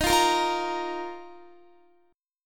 Eb Suspended 2nd, Guitar; Ebsus2; strummed

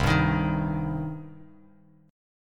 C Minor C down Bass Standard; strummed; JGuitar

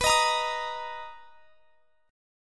B Major 7th B down; strummed

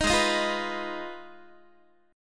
Ab Minor 6th, Ab, Guitar; Abm6; strummed; 18,16,18,15,15,x

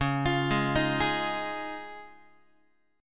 C sharp Major Add 9th, Guitar; C#add9 arpeggiated; JGuitar

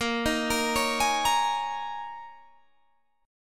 D sharp 7th Suspended 4th A sharp, Guitar

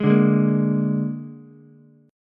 Bb 7th Bb down Ukulele; strummed; JGuitar

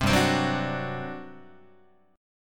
G Major 7th Suspended 4th, Guitar

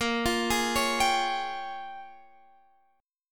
Bb Minor 13th Bb down Guitar Drop D

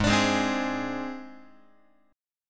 Ab Major Flat 5th Ab Guitar; AbMb5 strummed; JGuitar

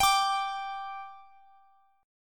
G 5th G, chord

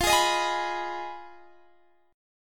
F Minor 7th Flat 5th, Guitar, Standard; strummed; JGuitar

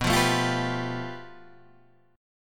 A 7th Suspended 2nd Sharp 5th

